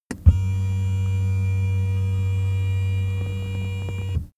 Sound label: car seat massage engine